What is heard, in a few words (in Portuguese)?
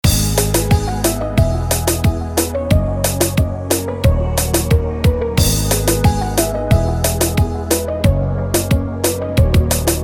remix romantico